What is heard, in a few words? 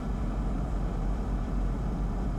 Ambience Loop, outdoor